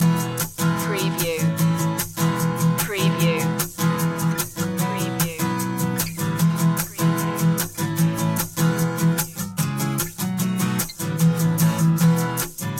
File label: marimba surfer loop